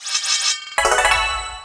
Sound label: black weapon perfection